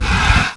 Ghost Dragon Hurt Sound; Heroes3

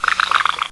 Water Elemental Move Sound

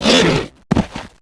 Lizard Warrior Death Sound; Heroes3

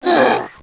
Imp Hurt Sound

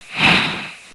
Storm Elemental Attack Sound; Heroes3

